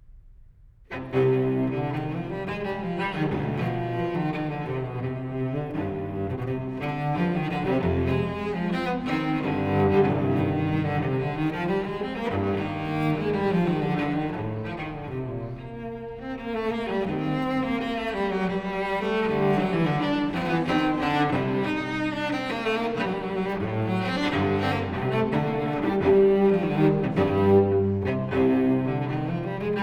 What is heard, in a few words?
Unaccompanied Cello Suite No. 5 in C minor, BWV 1011 III. Courante; Bach